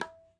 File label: Tin, Tabla; ETHNIC and WORLD PERCUSSION; Tabla Kit Large